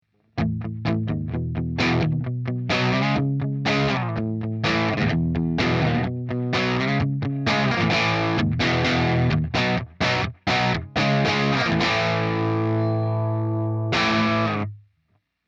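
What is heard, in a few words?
solo lead crunch, guitar; Helixシリーズのマルチエフェクター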